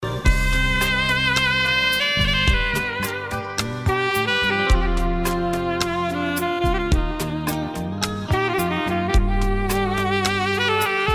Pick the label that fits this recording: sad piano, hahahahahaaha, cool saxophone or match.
cool saxophone